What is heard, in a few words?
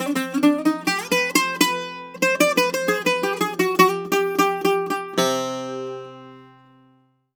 BOUZ C-1, bouzou